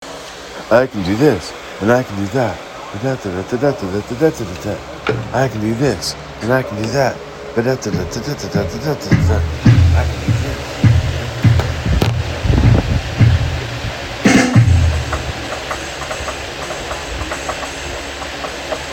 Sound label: drums; i can do this and that drums swing